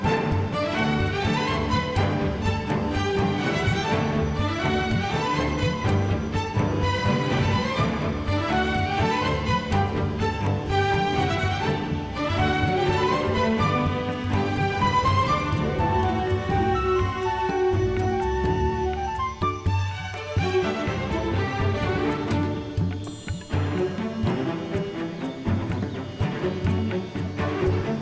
Jungle Music; Kinectimals; Android; Xbox 360

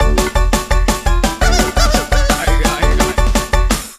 Jingle Teensie Dance; rayman 3 hoodlum havoc gc ps2 windows xbox gamerip 2003

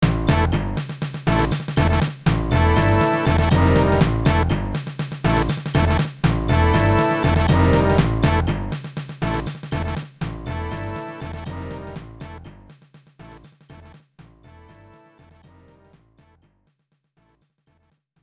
Battle Report (Ranking); Strikers 1945

